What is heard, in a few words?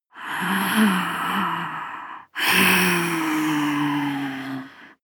breath female moaning breath angry